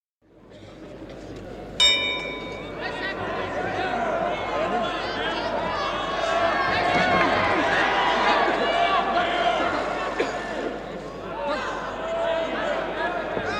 3rd round - enthusiastic, starts with bell Royal Albert Hall; Miscellaneous Sport Sound Effects; BBC